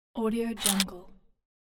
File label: the curtain